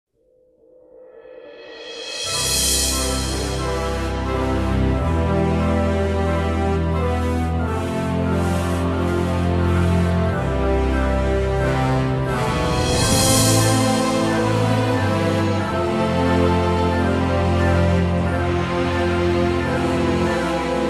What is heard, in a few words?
Jingle Cast Away End